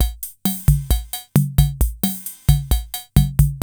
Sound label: KR55 LATIN; Crypton - DrumBase5000; KR55B